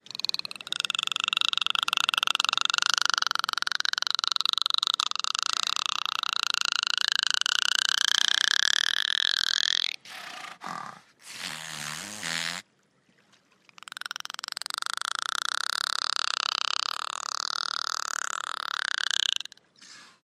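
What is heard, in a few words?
beluga; beluha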